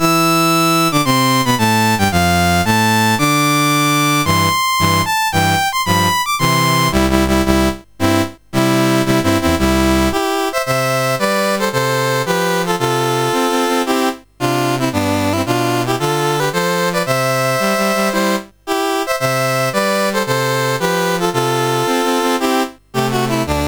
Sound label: Bolivia National Anthems, CSG8580R5